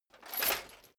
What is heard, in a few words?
metal chain movement on plate single